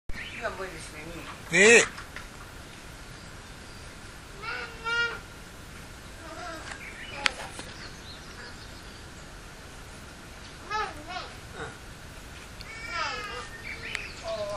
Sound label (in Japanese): uguisu